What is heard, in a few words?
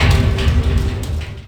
Metal Barrel Slide; Warframe; Ambience; Nineteen Ninety Nine